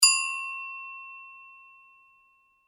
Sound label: bell small, Bell (Small) Ring; Bell Ring ( Small ) - Could Be Reception , Elevator Or Bicycle Bell Etc; Musical; McKinney Sound